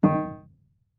marimba piano; ゆめ2っき

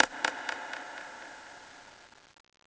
se sentaku echo

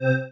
marimba spacesuit; ゆめ2っき

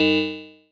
marimba; ゆめ2っき

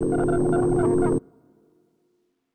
low drone; ゆめ2っき

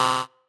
marimba bike

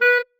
marimba penguin; ゆめ2っき

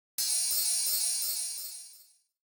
Sound Break

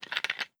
PET bottle open

